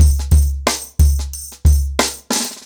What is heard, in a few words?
Nuclear 90BPM, ACOUSTIC DRUMS